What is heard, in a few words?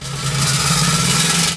gate rise, doors; gesource